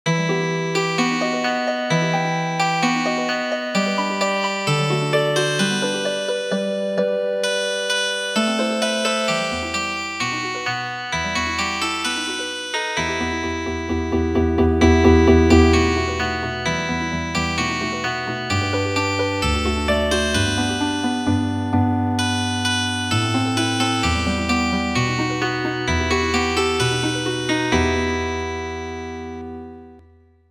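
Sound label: Lullaby Healing Harp; midi; traditional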